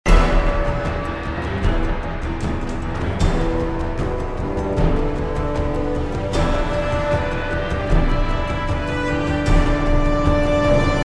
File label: mix paralel compression no, No parallel compression; epic orchestral track